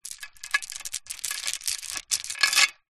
chain thick movement wrapped around metal gate post; foley